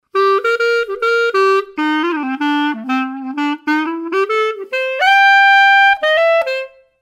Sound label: JAZZ